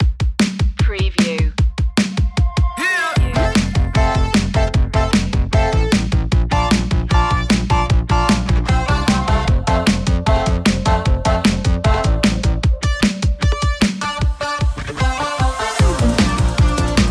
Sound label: snap out no vocals